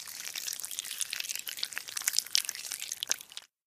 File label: earthworm